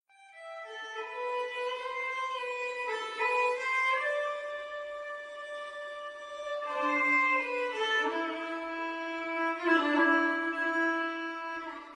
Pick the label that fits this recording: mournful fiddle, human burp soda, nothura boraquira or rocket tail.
mournful fiddle